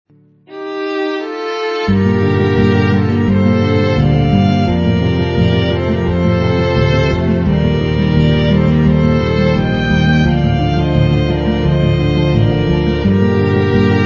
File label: Lose; Weapon Throwing RPG 2; android